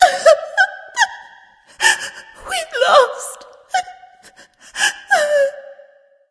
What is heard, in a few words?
boohoo; smite; aphrodite